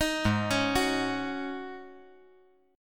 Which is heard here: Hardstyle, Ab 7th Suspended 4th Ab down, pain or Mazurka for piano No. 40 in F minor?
Ab 7th Suspended 4th Ab down